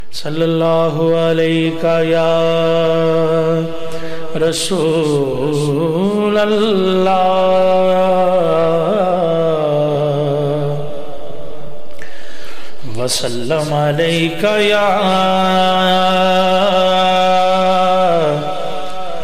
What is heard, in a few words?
Durood Before Start of Naat